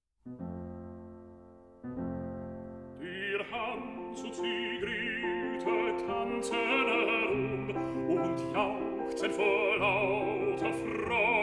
for voice & piano; Der arme Peter; Schumann

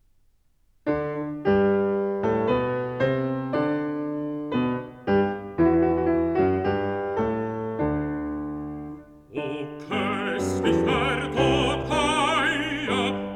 song for voice & piano

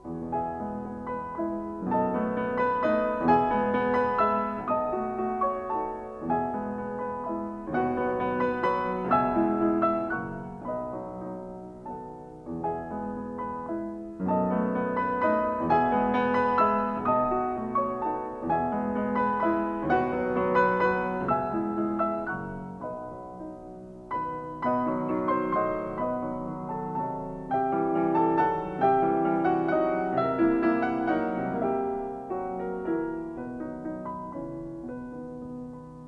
Waltzes for piano, 4 hands (or piano), in E Major; Brahms

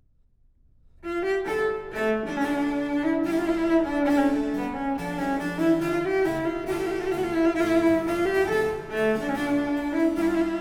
Cello and Organ

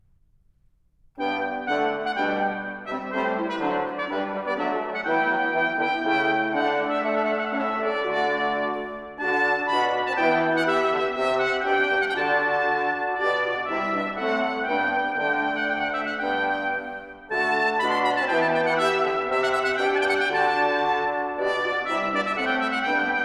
Sonata for brass

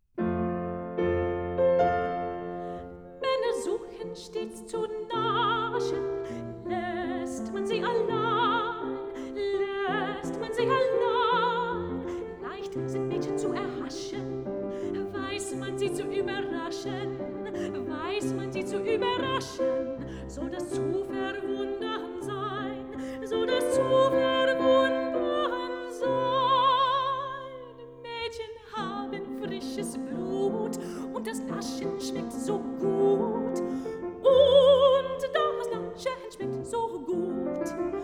aria for bass & orchestra (fragment)

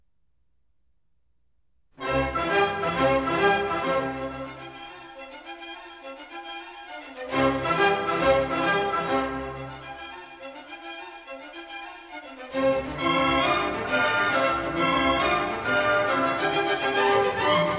Symphony in C major: Presto assai; Mozart

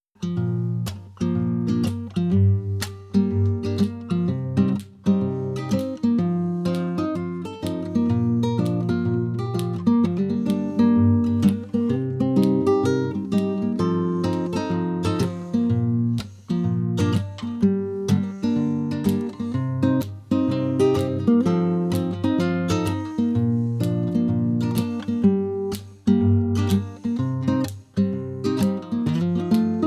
Acoustic Guitar

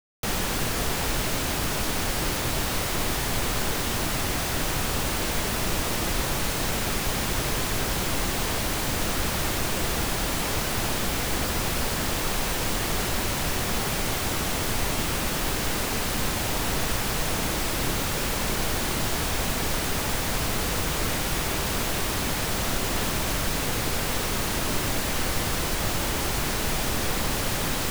Stereo pink noise; Reference Tones; Surround Spectacular

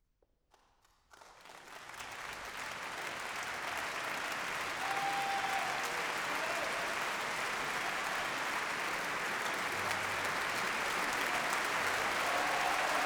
Applause; Mahler; Symphony No 6

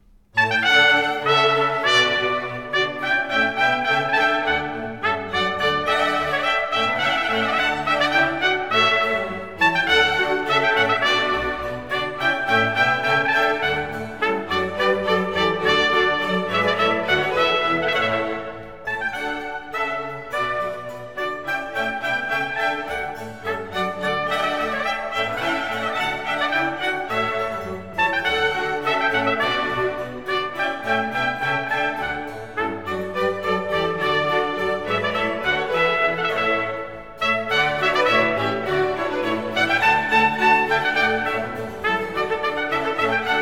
suite for trumpet, strings & continuo in D major; spurious arrangement; Water Piece; Trumpet Concertos

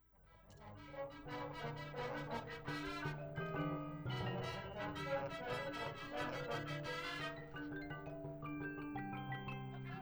Drum Corp (Moving) Test B; IsoMike Tests 2006

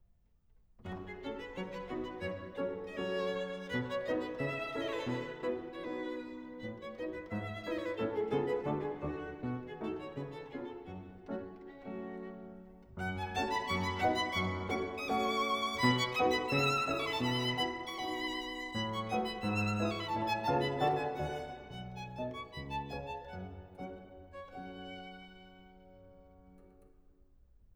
Romanian Folk Dances, Allegro; II． Waistband Dance

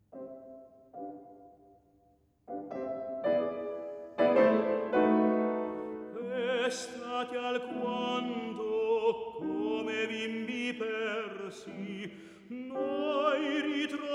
song cycle for voice & piano; Biondina; E stati alquanto; Songs by Charles Gounod

